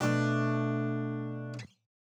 100 D min Kit Guitar end

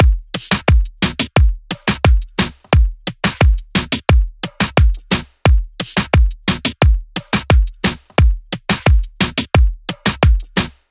88 loop drums full; mila

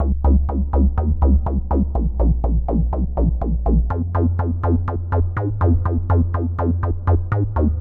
123 C Running Time

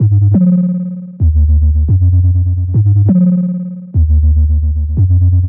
175 A- wobble loop

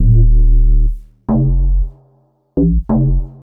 140 A Bass

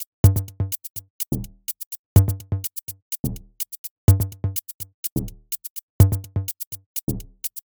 Drum 125bpm Top

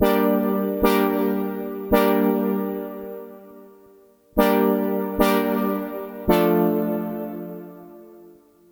Gm Synth, 110

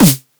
Snare

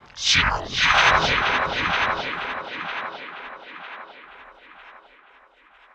Vocal Hit, 126bpm, HYPNOTIC TECHNO; Zenhiser

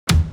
Sticks 122 G Wet

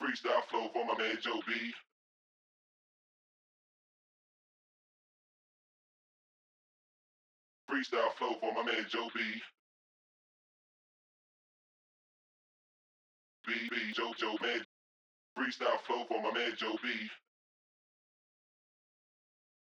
Vocal Dry G-min 125bpm

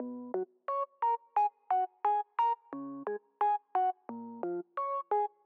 88 Fmaj Synth Arp Dry; Ilusionar

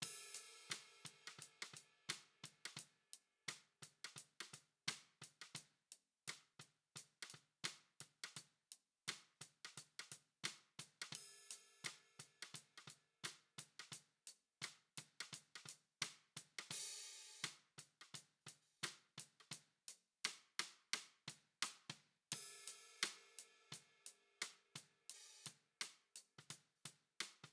overhead

